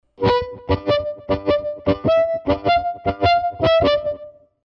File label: Pull Diato, harmonica